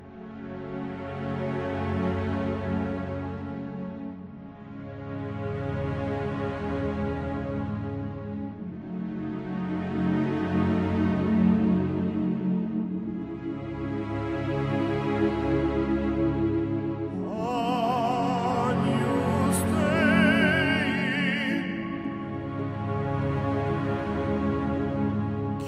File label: Sad Orchestral Music